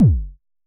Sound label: Unce Unce Kick F#1, Vermona Kick Lancet; LookIMadeAThing Kicks